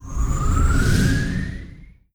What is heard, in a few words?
moving out destroyer; Stellaris